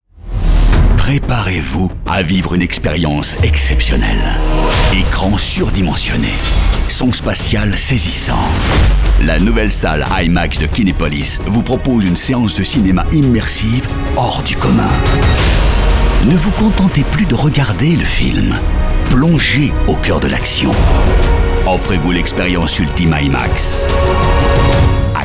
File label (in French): Radio, Musique originale; Spot Radio IMAX; IMAX Kinepolis